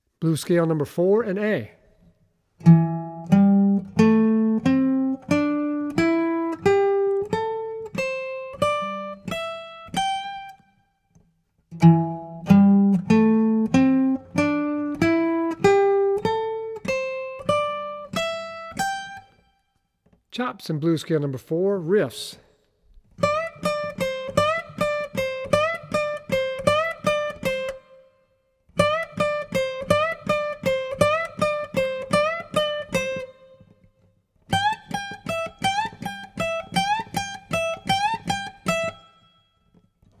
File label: Blues Scale in A; Blues Scale #4 and Chops in A